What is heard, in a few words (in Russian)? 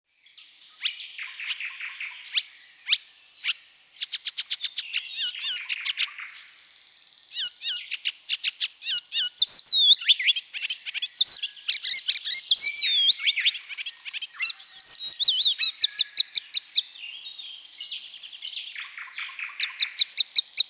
zelenaya peresmeshka golos